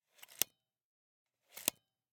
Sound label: Camera, Pentax KS2, DSLR, mechanical, adjusting lens, 2 moves; Eiravaein Works; Vaeyan IV